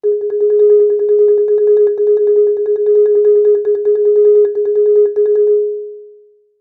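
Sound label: Marimba roll fortissimo Ab2; MIS Pitches - 2014; Percussion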